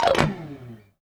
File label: CHORUS LID, Guitars; Spectrasonics; Hans Zimmer Guitars VOL-1; FUNK CHORDS